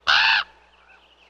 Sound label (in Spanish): graznido cuervo